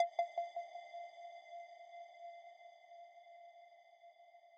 arp

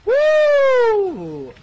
wuuu; 电棍

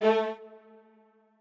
strings

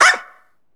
SE GAME BREAK; maimai

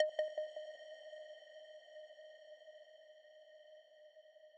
arp

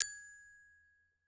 gloken

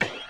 breakbeat

